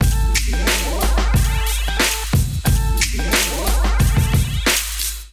Kit Melodic 90 bpm, Drums Complex; MIDI Clips; Bang Ya Head; Ableton; Chop and Swing

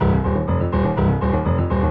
Ostinato Octave Bass C Minor 125 bpm, Grand Piano; MIDI Clips; Ableton

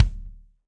Kick Plastic; Drums; Drum Hits; Standard Hits; Cop Style; Ableton; Session Drums Studio

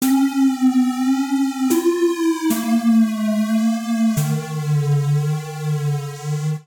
Sad Triangle A Minor 144 bpm; MIDI Clips; Tonal; Percussion & FX; Skitter and Step; Ableton Factory Packs

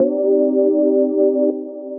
Mellow Twins, Synth Lead; Ableton; Beat Tools

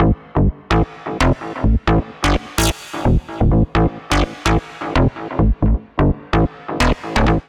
Bass A Minor 128 bpm; MIDI Clips; Ableton; Factory Packs; Skitter and Step; Jazz Lyfe

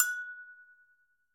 Agogo A High; Latin Percussion; Drum Hits; Bell; Ableton; Factory Packs

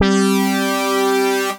Many People Keys, Synth Keys; Ableton; Creative Extensions; Poli

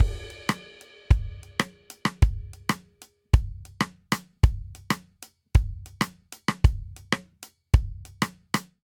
Simple Seven Intro 7/8 190 bpm, drum; Ableton; Factory Packs; Drum Booth

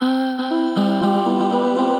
Hocketing Voice, Transformed Voices; Voice Box; Chromatic Voices

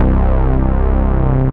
Zero Hour Bass; Synth

